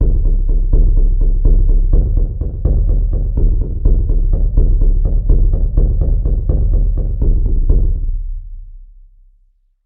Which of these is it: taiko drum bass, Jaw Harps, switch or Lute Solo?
taiko drum bass